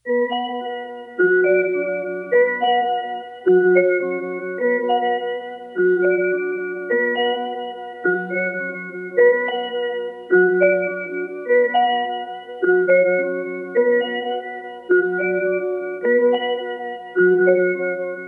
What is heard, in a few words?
Dark Melody Loop - 105 BPM F# Min Bell; Melodies; Loop Stems; Cymatics; Oracle Sample Pack